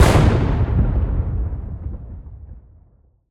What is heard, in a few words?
Howitzer, M101, C1, 105 mm, Distant, Right Side, Shot, Pound, Thick; Airborne Sound; Battlefield Howitzers